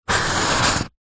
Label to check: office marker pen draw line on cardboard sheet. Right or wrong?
Right